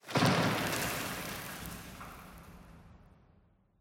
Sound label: tenement concrete debris; ambient, rocks